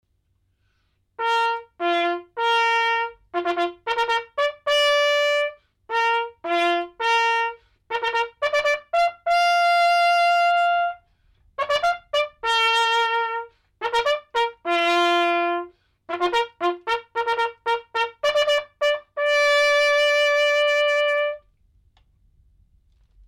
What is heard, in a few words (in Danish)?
hunde fanfare